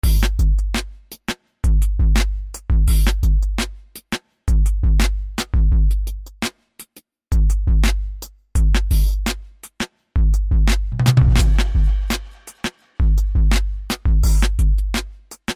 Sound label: Dub And Bass